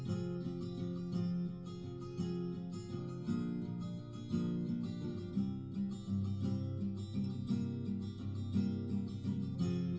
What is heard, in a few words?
nodrums liverec 250ms 1m sim